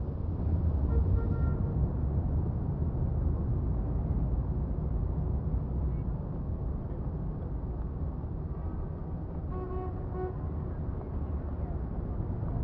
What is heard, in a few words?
ambi in church; Mafie 1